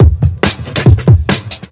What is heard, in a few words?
Breakbeat; Loops; Vengeance Samples